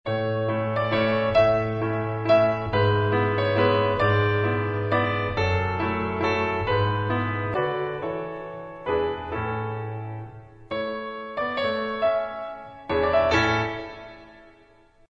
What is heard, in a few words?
Piano Sonata